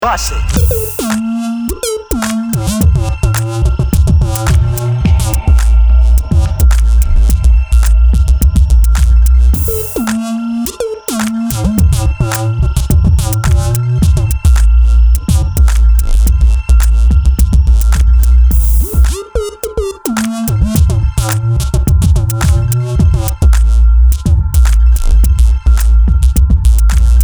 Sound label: Instrumental